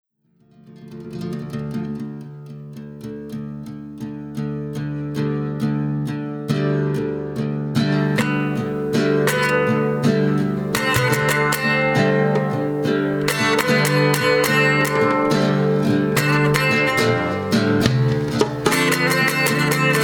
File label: kebab blues; cdhoboes favorites collection vol13